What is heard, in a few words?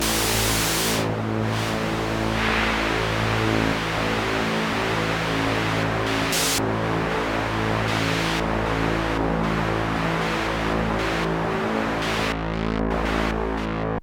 A pulse